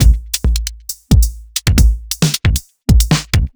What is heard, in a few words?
Dance Beat; Pro Tools Essential Loops; Dance DJ; Beats